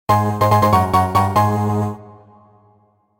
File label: Item get; Dppt